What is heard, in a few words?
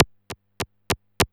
WAND TICK; AKAI Anime Sound FX; ZPINZOUNDS